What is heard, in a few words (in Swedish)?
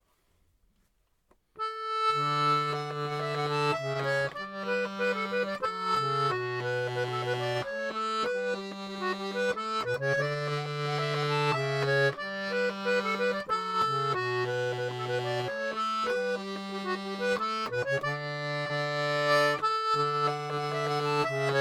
melodi basstamma; norrut